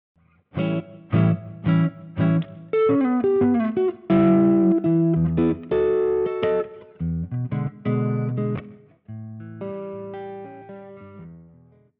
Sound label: lick